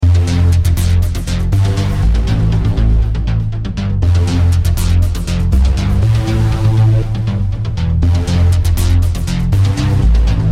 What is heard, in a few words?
Bass Beater